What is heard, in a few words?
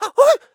frozen vocal; Scrat